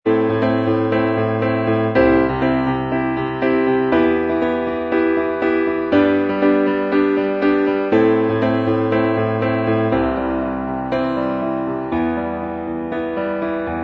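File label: acoustic version instrumental track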